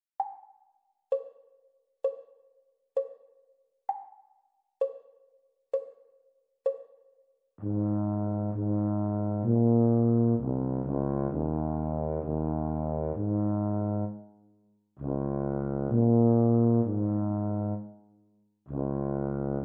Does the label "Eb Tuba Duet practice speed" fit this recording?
Yes